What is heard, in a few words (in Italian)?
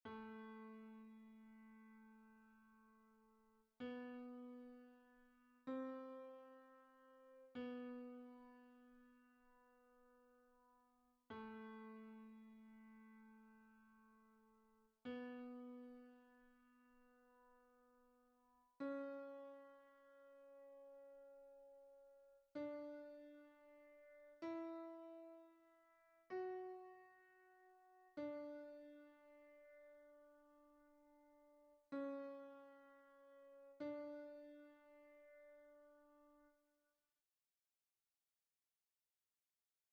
tenori piano